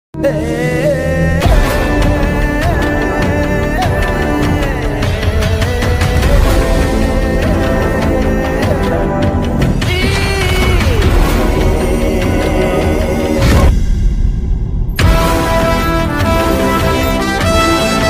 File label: Glimpse Bgm Ringtone; Kalki